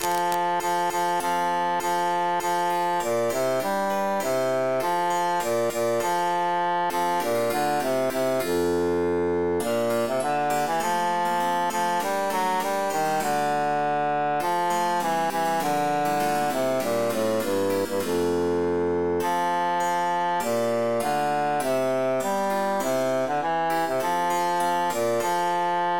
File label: bassus emphasized; fine knacks